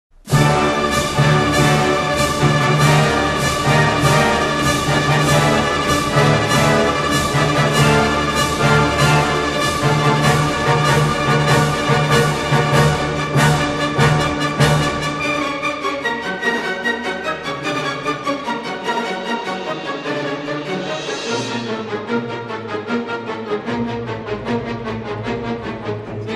khatchaturian flute concerto allegro vivace

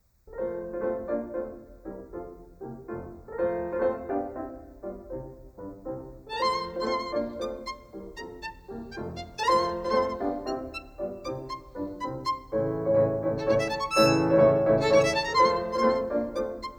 Violin Sonata No. 5 In F Major

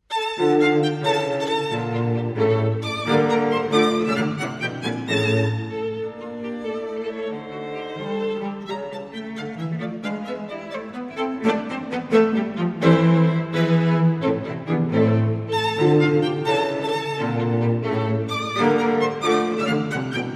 schubert string quartet no 14 scherzo

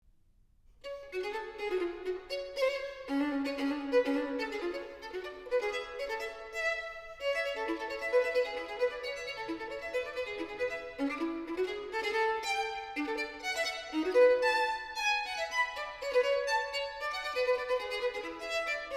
Bouree Anglaise; Bach

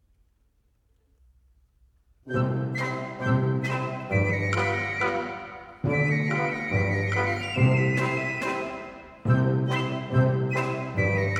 Solitaire Polka

